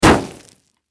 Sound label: Car Crash; teststrecke-game